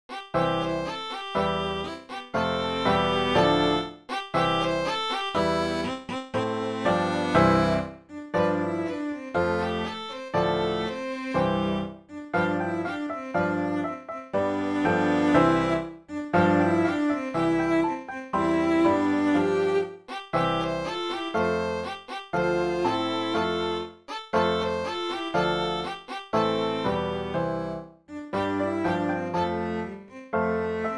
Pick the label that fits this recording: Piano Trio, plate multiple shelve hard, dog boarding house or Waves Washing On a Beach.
Piano Trio